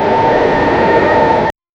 the sounds of the wheels, rails

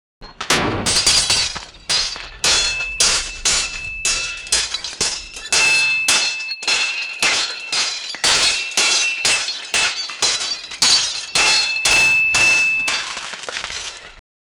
sound effect of roman battle, battlefield

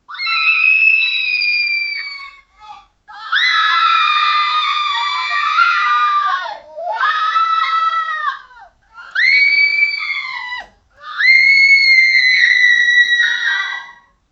year old girls screami, celebration

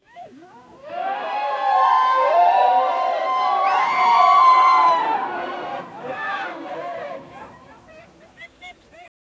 sound effect of a joyful, wedding

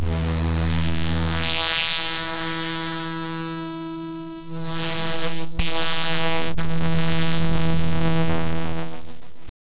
long buzz

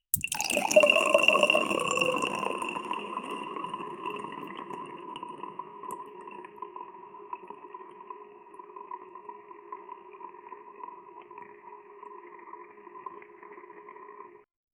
a rich satisfying coffee, asmr

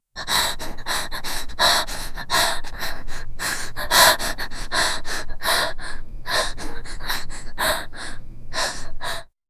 9 year old girl breathing; walking

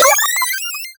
power up

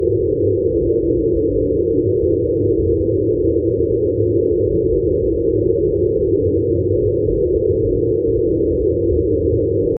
the vastness of space amb

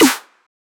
brostep snare one shot

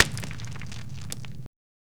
quiet demolition

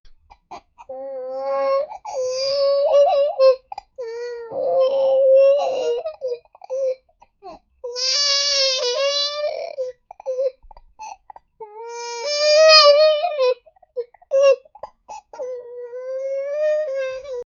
baby crying tantrum, infant; 1950s

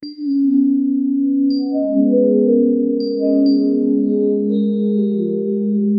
soft melodic tunes with a; bird chirping

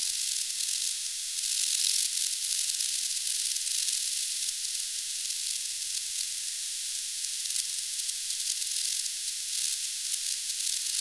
subtle rustling sound of, insects

